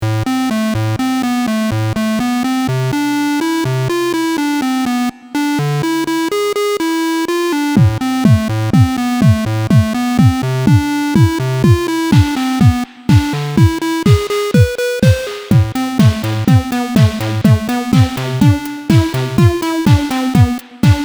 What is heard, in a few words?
Vermona Perfourmer mk2 lead drums reverb delay